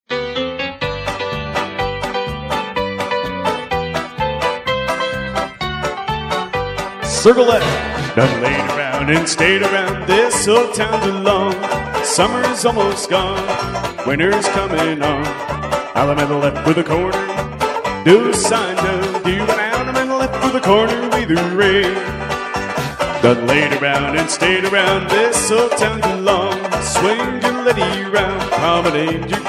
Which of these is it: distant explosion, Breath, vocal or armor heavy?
vocal